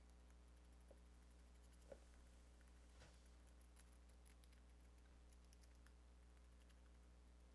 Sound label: Chatter, rat